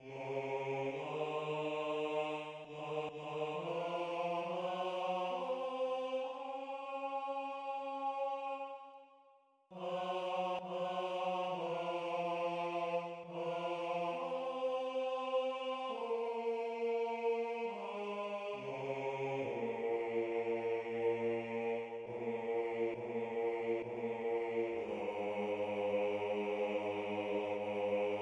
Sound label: bass; Yihyou leratson re